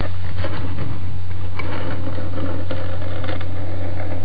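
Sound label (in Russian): автомобильный звук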